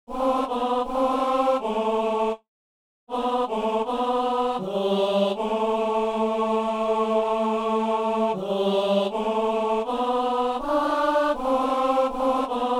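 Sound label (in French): ténor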